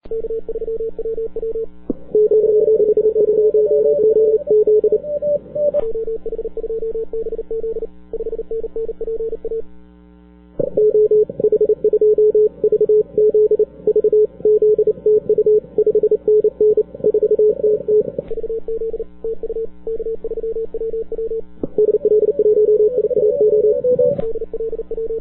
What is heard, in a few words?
cw; ARRL DX CW - 10M; at K3WW; After making the jump to 10m, Chas is my QSO #4 on that band.